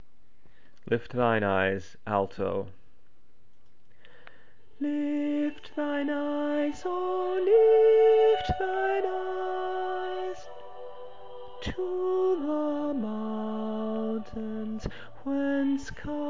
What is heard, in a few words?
Lift Thine Eyes alto